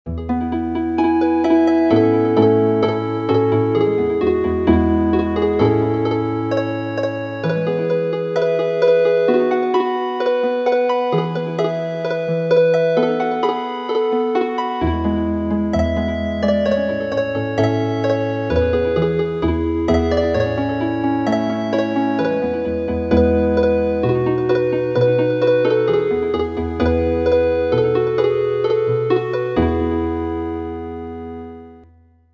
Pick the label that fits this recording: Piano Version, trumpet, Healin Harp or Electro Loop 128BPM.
Healin Harp